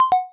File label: Lowbatt; Asus P525